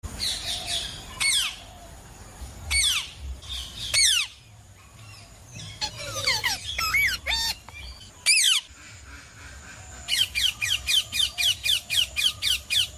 Parrot Sound

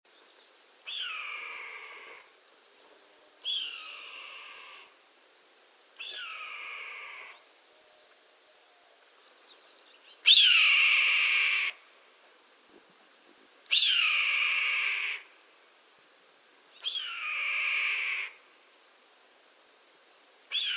Red Tailed Hawk Sound

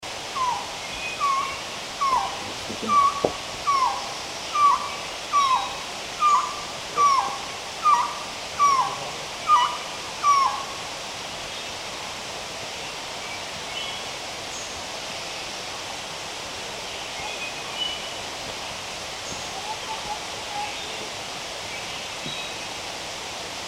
Resplendent Quetzal Sound

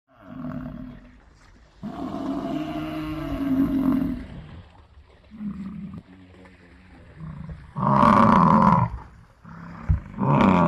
Indian Gour Sound

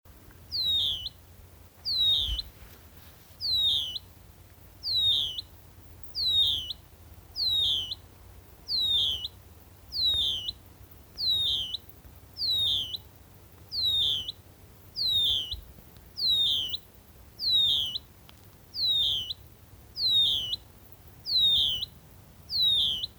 Nightjar Sound

